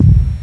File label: rumble